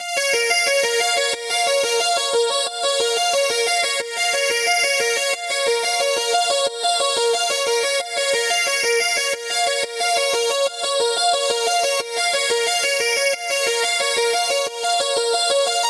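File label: Arp, 90, A minor, Wet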